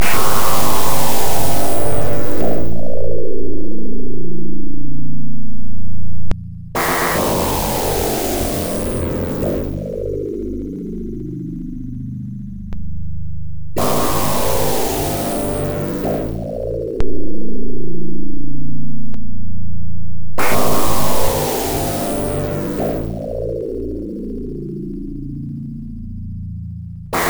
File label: Loop (Thunder); rpg tsukuru dante98 ii pc-98 1996